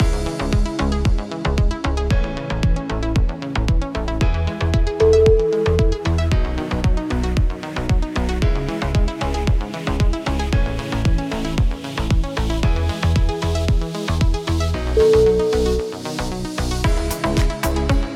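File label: beautiful life uplifting, music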